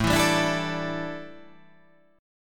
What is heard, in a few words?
A 7th Suspended 4th, chord